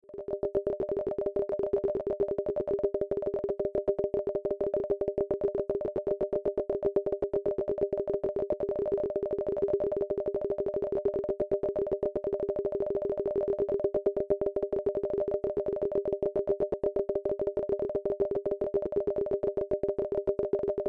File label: EEG simple Trigs